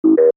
Computer Beep: Indicating Activity; Multimedia; Beeps; Stuart Duffield

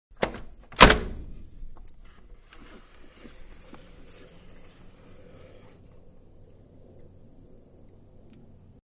Window Crank Open; Windows; Household; Window Crank Type-Opening; Manhattan Production Music

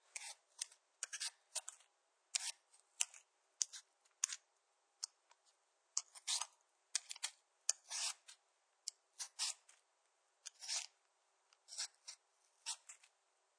Pelng Potoes, Peeling potatoes; Kitchen; Household

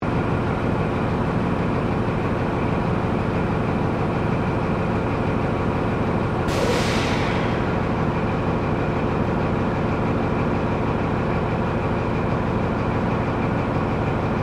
Train Melbourne Operation Loop; OVERLANDER; Various Melbourne Trains; Vehicles

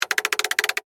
Steampunk Lever, Scifi, Steampunk, Lever, Crank; Science, Fiction; BlastWave

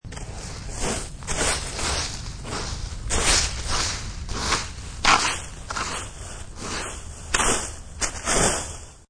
Rkng Lvs, Raking leaves in yard.; Yard Tools

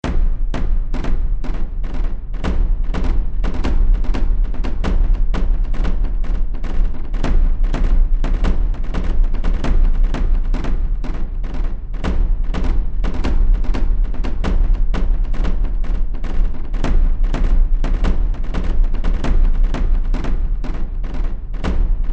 Drums Of Chaos, scary soundscape; Scary Soundscape Sound Effects

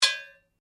Blade Heavy Moving Impact Ring, Sword; Foley sounds for melee weapons; Swords (Sound Effects:Combat)

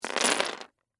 Game Pieces, Drop, On, Board; Sports; Plastic; Monopoly, Houses; Board Games; BlastWave

